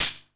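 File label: 808 open hi hat; Wizoo - Platinum 24 Electronic; 808 DRIVE P1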